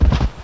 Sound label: hit wall; duke nukem total meltdown